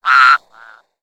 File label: Exterior Random Critter